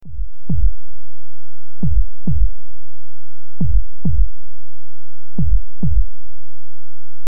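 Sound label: Loop Heartbeat 135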